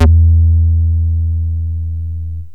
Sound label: square bass, yamaha rm1x synth